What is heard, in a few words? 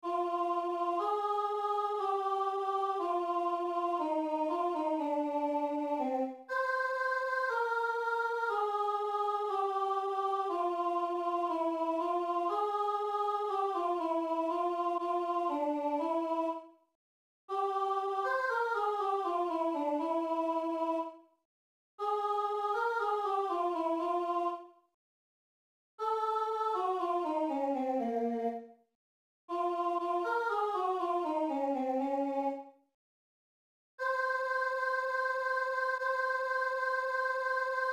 stabat mater amen alto